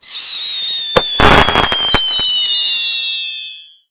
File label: FIREWORKS